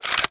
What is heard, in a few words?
inspect lever out; Valorant; marshall